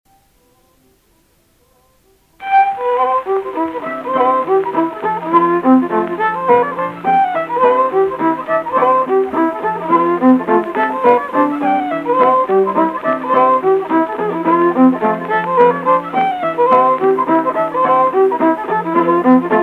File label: Mountain Stream and Parkers Fancy Hornpipes